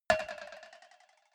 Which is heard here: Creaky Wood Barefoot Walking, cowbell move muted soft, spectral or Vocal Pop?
cowbell move muted soft